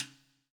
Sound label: POWER RIM